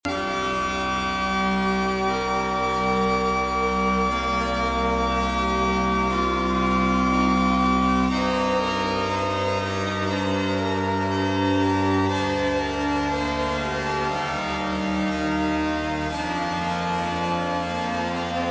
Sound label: Zebra3 Orchestra Experiment